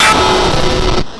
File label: Schmacked